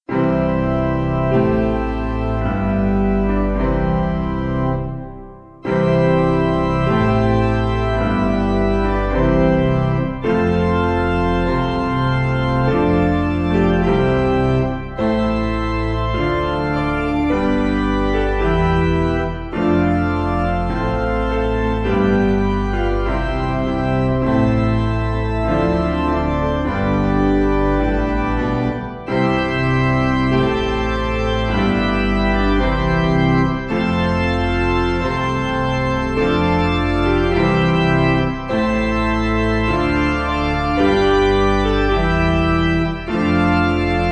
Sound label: Piano